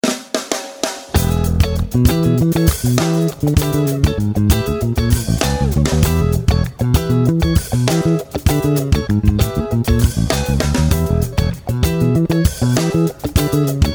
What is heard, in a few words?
Funk Riffs